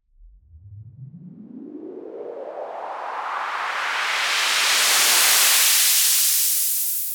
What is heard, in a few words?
Raise Clean